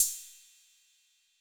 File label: Cymbal 808 Color